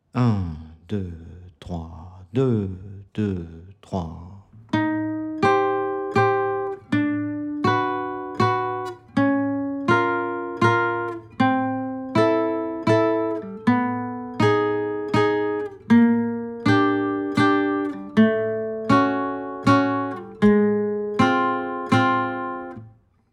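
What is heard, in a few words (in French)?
petit barre sur 3 cordes 080bpm, guitare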